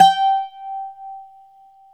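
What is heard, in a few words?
BZ P G, bouzouki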